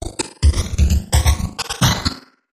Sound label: Hollow Bouncer